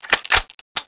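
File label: pickup, weapons; SINDEMO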